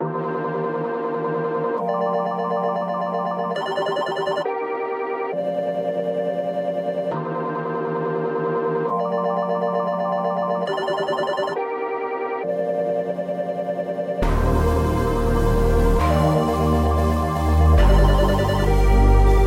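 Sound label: hiccup 135bpm G minor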